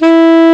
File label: E1 SMO SAX; AKAI S6000 CD-ROM - Volume 1; BrassWind